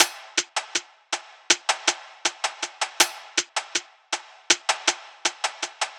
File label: Hihat Loop - 160 BPM; Cymatics; Light Free Sample Pack; Bonus Samples